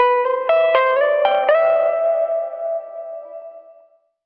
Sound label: guitar; Phonky